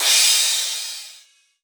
Crash, Cymbals; Vengeance - Essential Clubsounds Vol.5

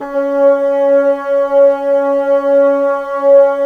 Bassoons C#4; Miroslav Vitous - Woodwind Brass Ensemble

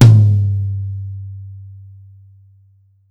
Y9 Tom; Akai MPC500

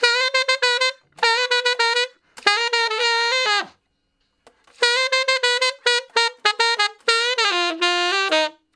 Ten Sax Straight (F), R&B; Solos

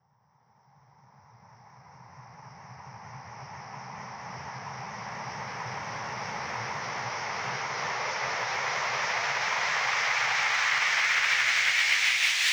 Uplifter 128BPM Noise Feedback; Vengeance - Essential Clubsounds Vol.5; Break the Chains; G#min

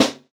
Snare, jungle breaks drum sounds

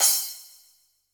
G3 Splash Cymbal, Yamaha PSR-E323 Standard Kit

